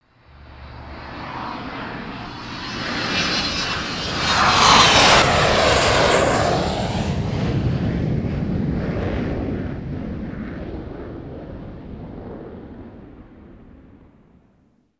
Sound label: distant rockets; nimbus station